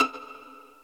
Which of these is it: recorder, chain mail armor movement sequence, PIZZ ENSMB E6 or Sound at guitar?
PIZZ ENSMB E6